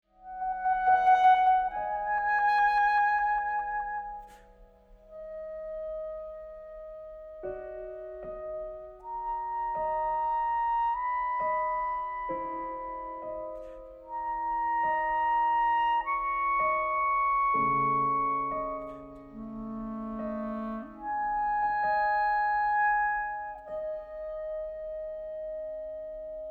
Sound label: Clarinet Sonata II. Timeless, mysterious